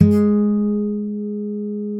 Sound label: MARTIN AC SUS G#3, Bass; Sampleheads; Will Lee Bass Library